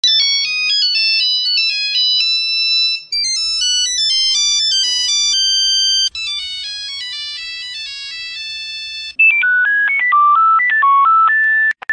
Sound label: nokia ringtone funny